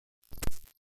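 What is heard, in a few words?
signal glitch; افکت صوتی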